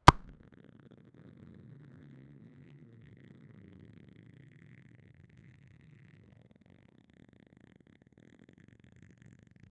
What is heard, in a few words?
muscle growth body transf